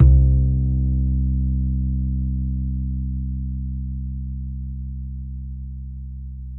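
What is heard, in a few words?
acoustic bass soft C 2, right; Masterbits CLIMAX 7 - Bass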